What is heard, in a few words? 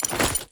armor up; skyrim